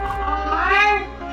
aaaaaaaaaaaaaaaaaaiiiiiiiiii

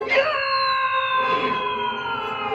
screaming; marvin the martian

